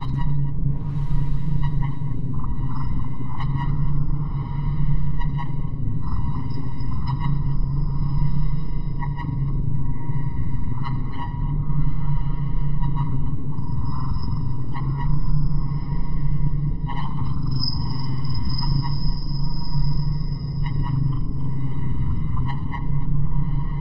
Cloning Room mechanical looping with low rumbling undertones; granular